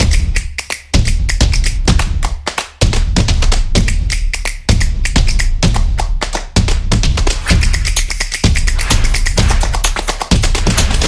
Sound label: Percussion Intro